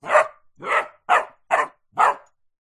animals dog puppy several weeks old barking ridgeback cross bullmastiff